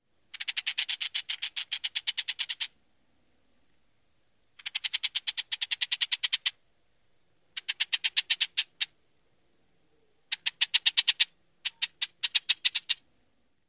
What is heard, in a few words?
call; AZ